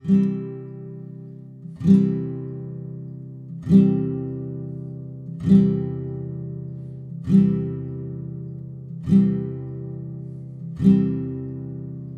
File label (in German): Stille Nacht Gitarrenbegleitung